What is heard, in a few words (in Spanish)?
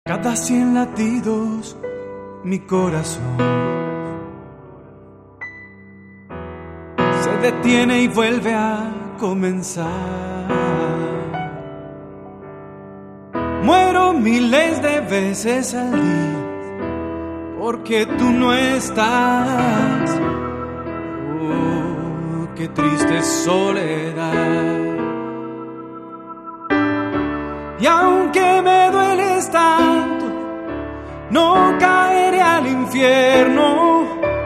Latidos; 100 latidos; Febrero 14